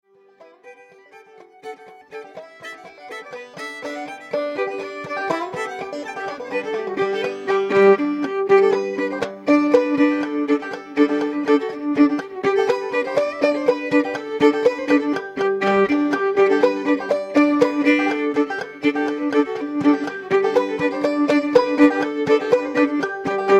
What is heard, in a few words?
fiddle and vocal, guitar and vocal